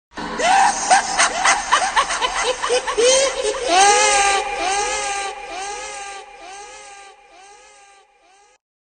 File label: echo laugh; Chucky